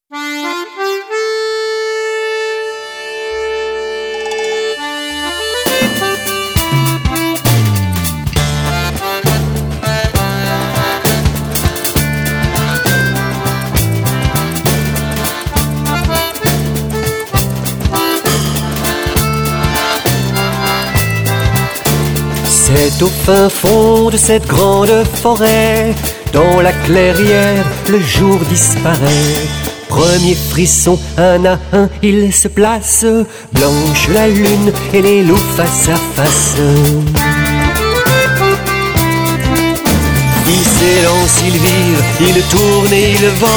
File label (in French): VALSE LOUP